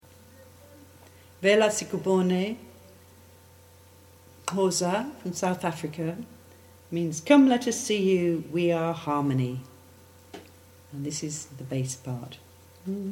Bass: Guidance recording of for Cycle of Songs Choir